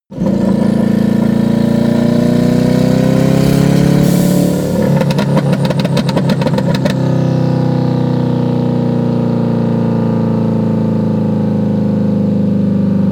car vw t1 short acceleration long deceleration

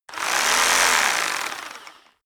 rock drill hammer rev long; maschinen; bohrer; soundpool

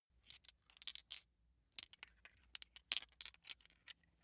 small metal handling jingle; industrie wirtschaft; metall

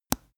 teeth clench bright, koerpergeraeusche; menschen; soundpool

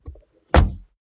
car bmw e46 exterior door rear close; Cars

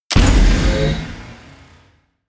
sci-fi click neutral heavy; hits impacts

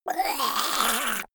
monster gurgling high; kreaturen

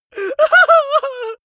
male really afraid whimper; vocals; comedy-cartoon; soundpool

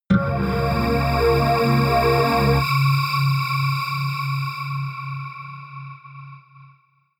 synth hit steam; whooshes rises

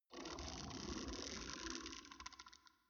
beast slobber deep wet, comedy cartoon; soundpool

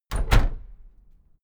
case lock close reverberant hard; objekte; tueren

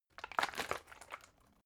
bottle water big shake single soft; berufe

